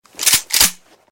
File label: shotgun